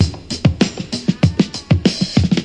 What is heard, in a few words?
96 punchy conga funk; 091 to 100 bpm